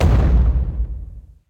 Explosion; Recruit Ulta

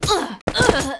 Cleric F dead

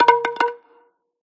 Sfx Baseball

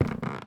Footstep Wood-Creaky

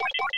Beep Red; viewfinder

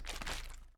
Sliming; Folk Hero

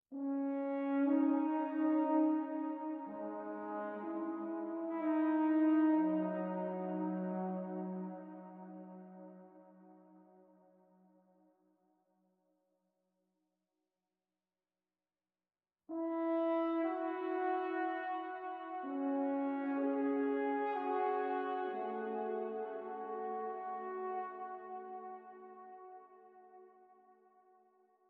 Dialogue Conflict Horn

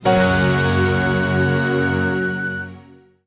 Masque Fanfare

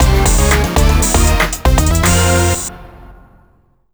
Jingle; K-1 World GP Zettai Ouja Ikusei Keikaku

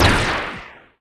Homing Projectile

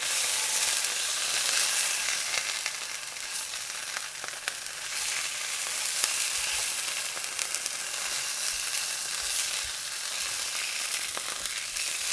Cooking Sizzle Fry Burn Loop

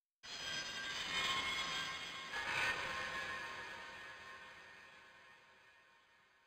Music Hub Layer erosion disto; Music Hub Layer erosion disto 04

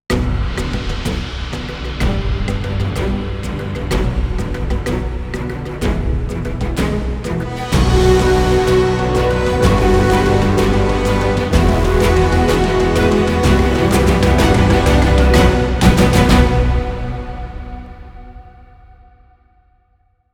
Sting Jungle Set Sail; Atlas

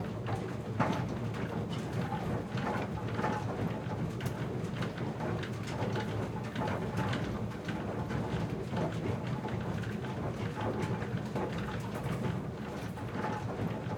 Amb Engin; Eve Amb Engin Am B B1

